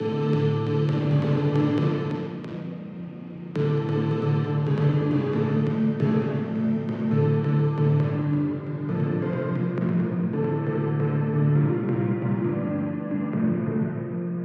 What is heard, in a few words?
bass